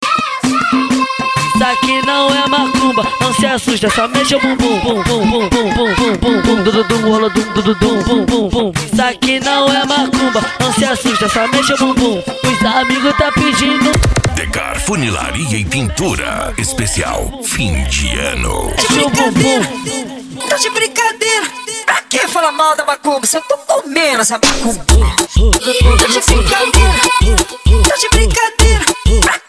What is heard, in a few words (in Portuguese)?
funk ritmado